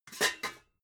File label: pots clank soft